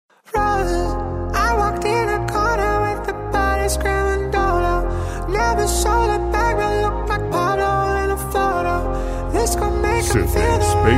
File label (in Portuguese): pancadao rap e hip hop